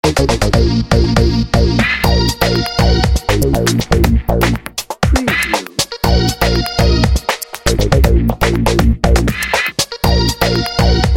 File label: urban electro